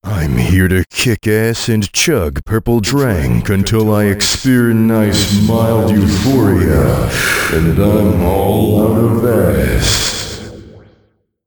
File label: chug